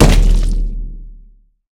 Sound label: impact; smite; god scylla